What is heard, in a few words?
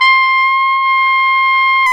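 trumpet, harmon dry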